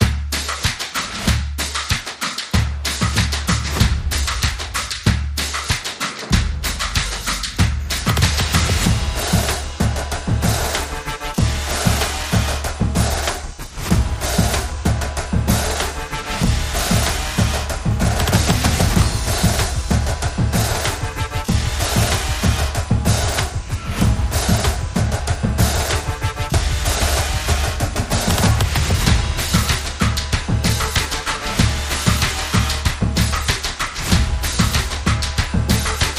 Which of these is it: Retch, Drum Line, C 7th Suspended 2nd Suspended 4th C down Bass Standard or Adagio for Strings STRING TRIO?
Drum Line